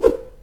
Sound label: swing; SimplyGladiators